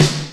Clear Snare Drum D Key, acoustic snare, Loudest frequency: 1713Hz; snare drum samples